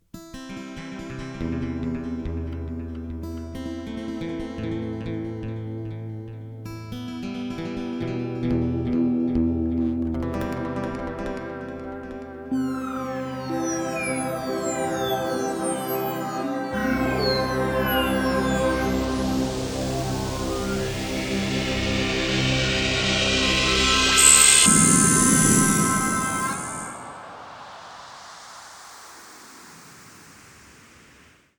acoustic

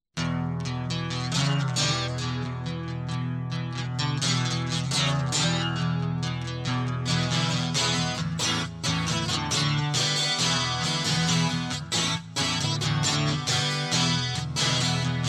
Guitar Loop

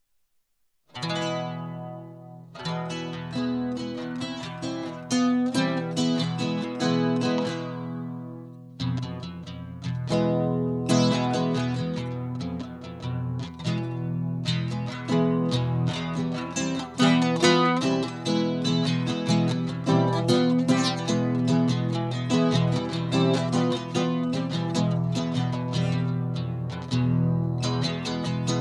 acoustic

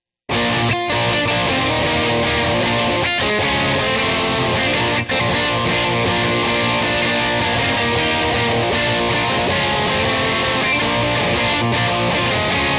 electric

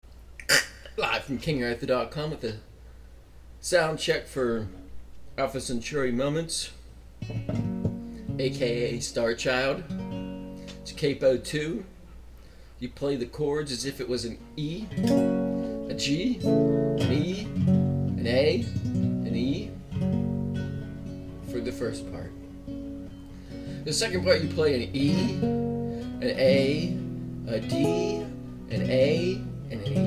Soundcheck

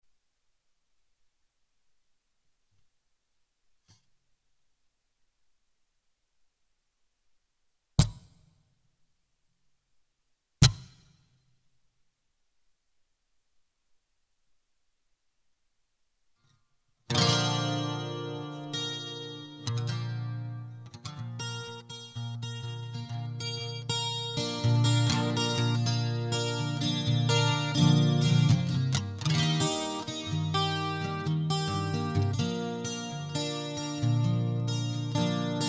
Instrumental

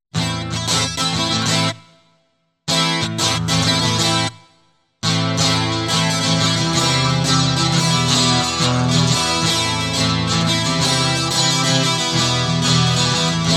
Guitar Loop

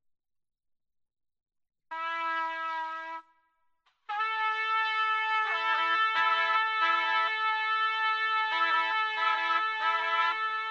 Trumpet Loop

